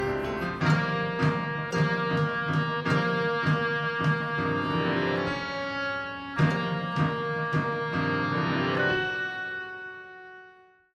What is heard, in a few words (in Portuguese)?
tamborim